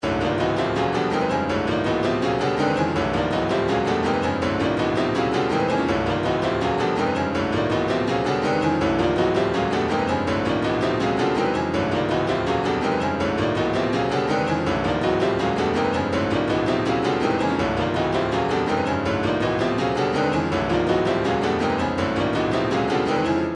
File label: retro funk 082, midi; slinky